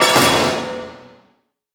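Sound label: Sheet Metal Stack Drop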